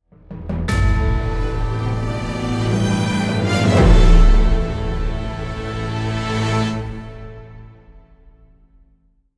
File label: Mission Failed; Ace Combat 7 Skies Unknown